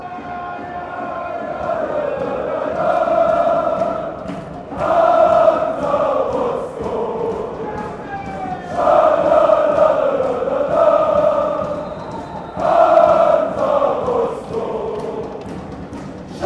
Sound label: lalala